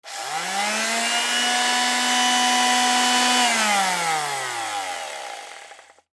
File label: Palm Sander Turn On Run Turn Off Craftsman; Industry